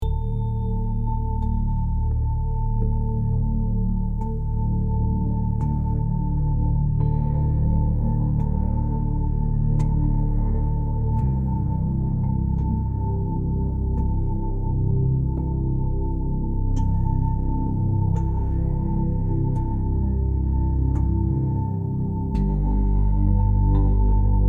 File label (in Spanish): frecuencia solfeggio 285 Hz